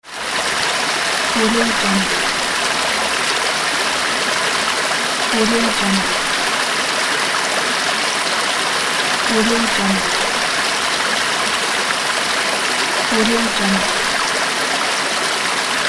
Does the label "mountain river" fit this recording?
Yes